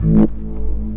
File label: hardcore, BASS, Techno Trance